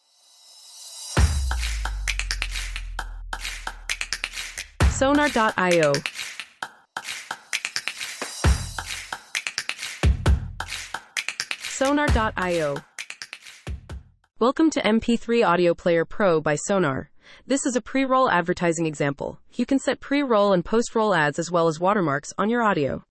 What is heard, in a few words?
Upbeat Snaps